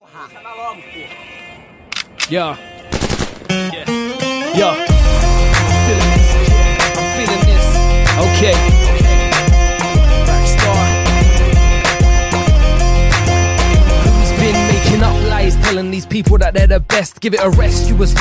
dj sitar mix ringtone

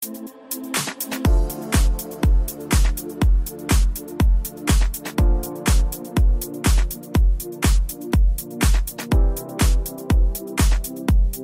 ether party iphone8 ringtone

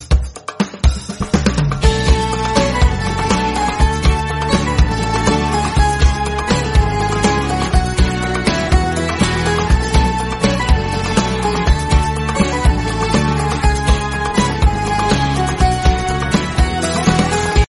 turkish ringtone

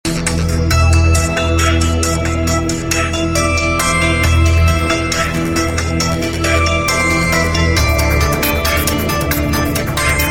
deep love tone ringtone

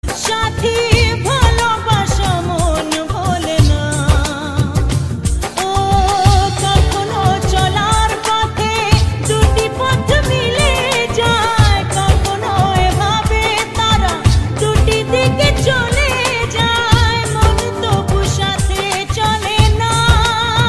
bangla sad ringtone

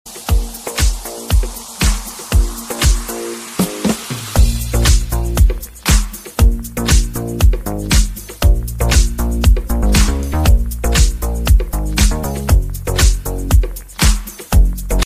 iphone7 tone ringtone

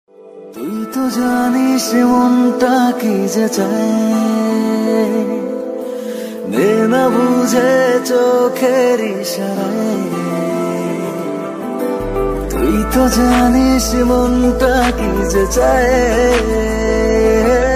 bangla ringtones

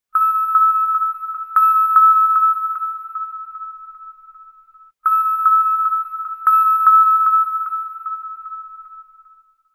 marine ringtone

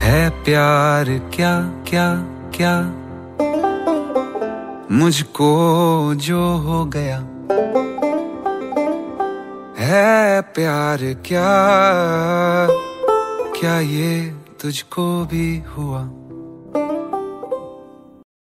bollywood ringtone

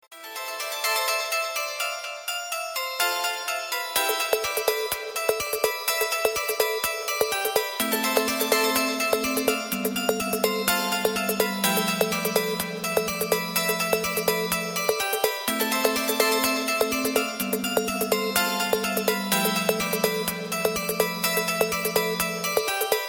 instrumental ringtone

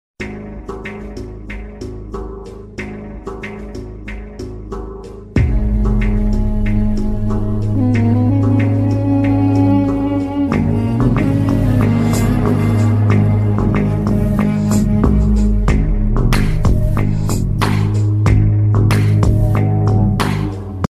instrumental ringtone

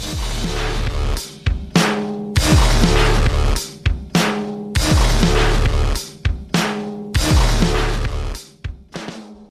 message ringtone; avengers

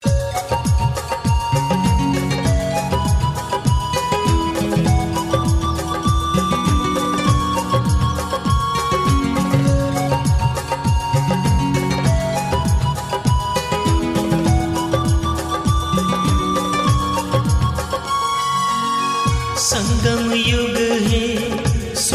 marathi tone

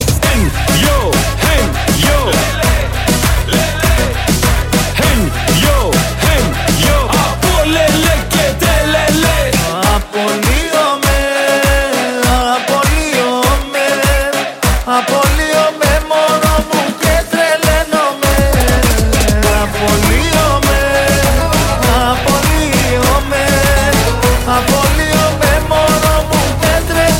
arabic ringtone

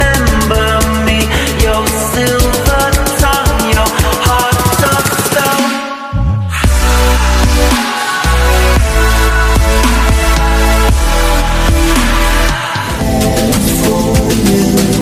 latest english ringtone

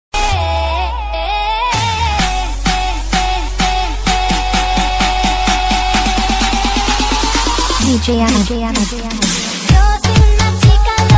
most lovely music ringtone

